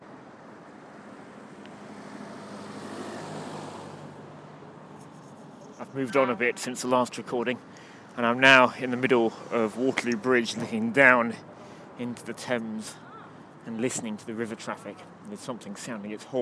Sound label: Waterloo bridge soundscape